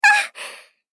Shriek "Ah"

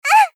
Polite Damage Ah; Rei Yumesaki

